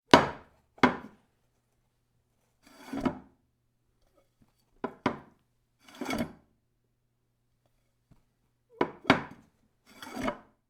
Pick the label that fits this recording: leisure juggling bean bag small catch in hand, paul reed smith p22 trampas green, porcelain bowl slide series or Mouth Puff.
porcelain bowl slide series